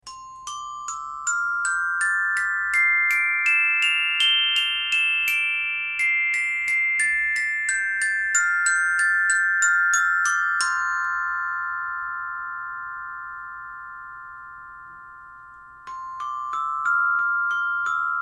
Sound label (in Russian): metallofon